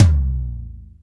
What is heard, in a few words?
TOM D, Drum Dry; East Collexion; Drum 1